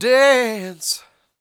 DANCE; R&B Dance; Spectrasonics Vocal Planet

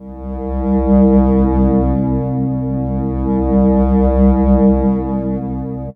Pad; Dream Zone

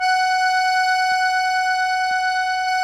KEY, Cajun Acord; Club-50 - Foundations Roland; Accordians

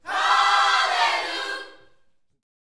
Vocal, Gospel, PHRASE; Spectrasonics

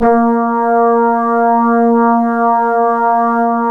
A#, Synclav FM, BRASS; Big Fish Audio

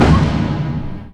FX HIT, Techno Trance; Ueberschall

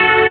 DR. LOOP; X-Static Goldmine 3; CHORDS

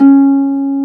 HARP; AKAI S-Series CD-ROM Sound Library VOL-2; CHO HARP

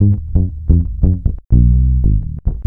ACID JAZZ

